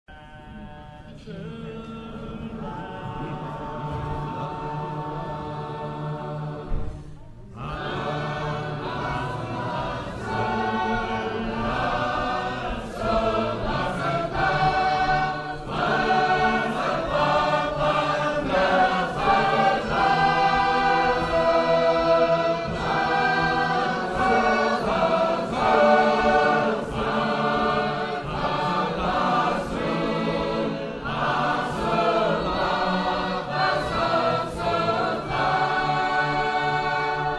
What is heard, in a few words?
Sacred Harp; Portland; 2006 Convention; Liverpool